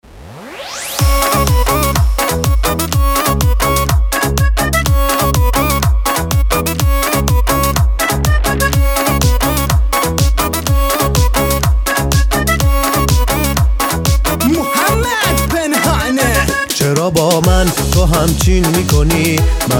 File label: bandari; car bandari shad